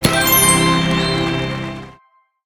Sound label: Medal; OvenBreak 2; iOS mobile